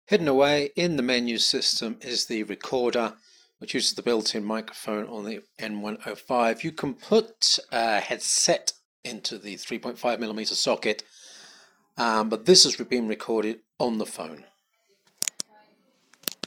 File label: Recorder Builtin